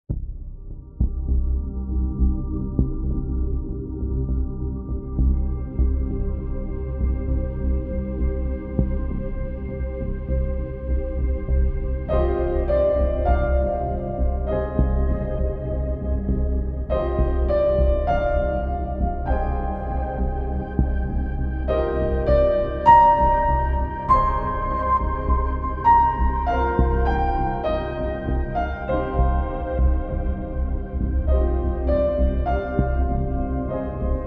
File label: Ambient Piano